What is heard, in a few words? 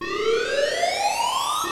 Siren